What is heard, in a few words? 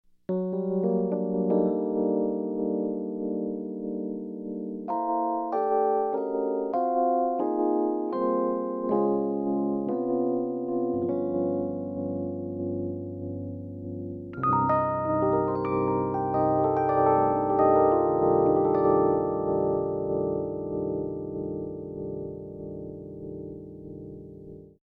rhodes